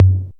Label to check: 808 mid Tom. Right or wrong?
Right